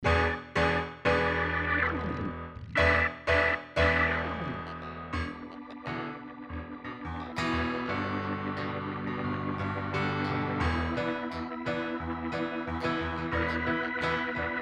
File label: piano and keys